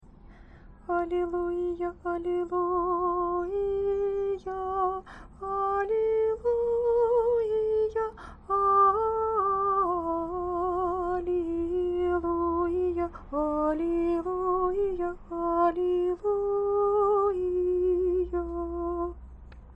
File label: halleluja, singing